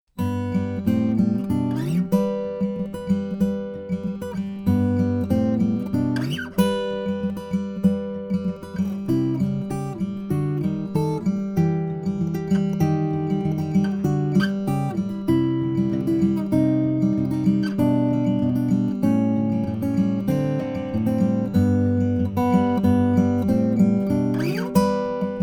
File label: finger, guitars